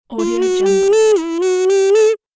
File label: onomatopoeic oldschool game ding